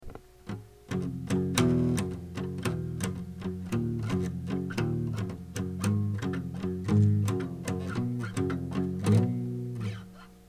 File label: guitar